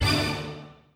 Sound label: prize hit; Golden Sphinx; wazdan